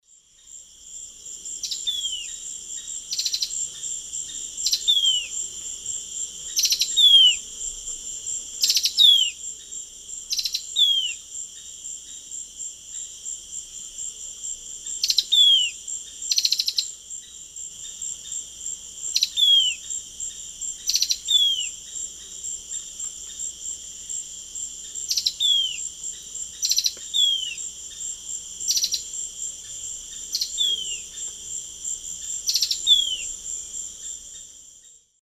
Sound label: Myrmeciza atrothorax, call; S. America; Eastern Lowlands